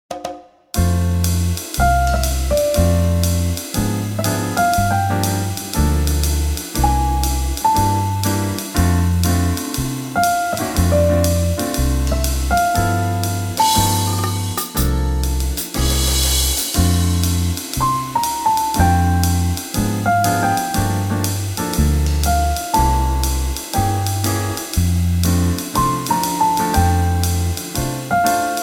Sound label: Piano